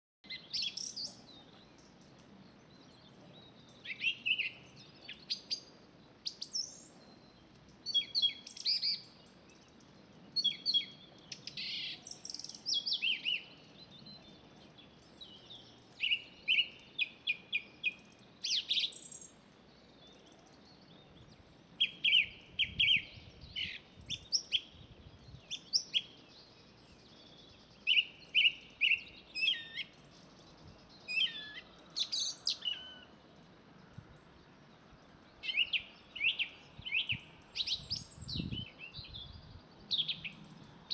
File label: thrush